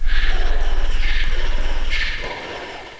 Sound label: heavy move, plats; svencoop